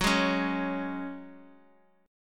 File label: Gb Suspended 2nd; Gbsus2 strummed